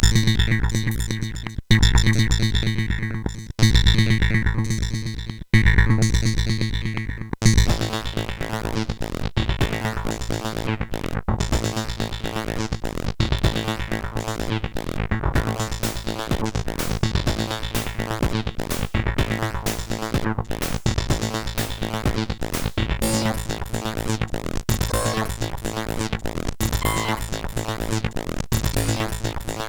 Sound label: Distortion; HVSC